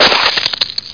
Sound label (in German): zerstör